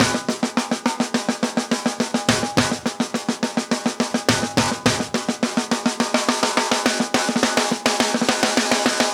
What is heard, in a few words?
105 Snare Vamp